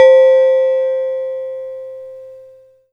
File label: Yamaha PSS-280 Toy Piano C5